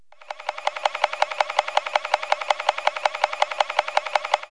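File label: SMALL MOTOR; CITYLIFE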